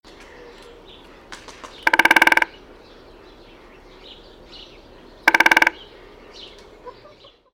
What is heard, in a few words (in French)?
Pic epeiche Dendrocopos major